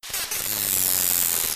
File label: risso; walvisstrandingen